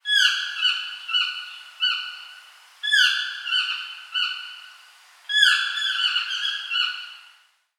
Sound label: birds